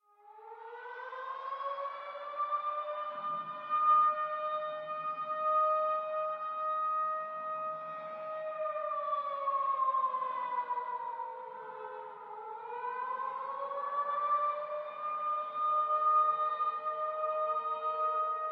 Air Siren Distant; Criminality

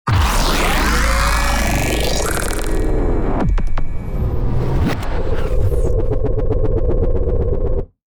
Designed Shell Shock

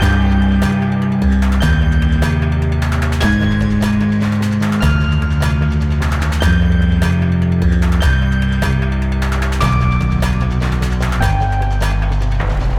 Boss Combat Winning; Griftlands

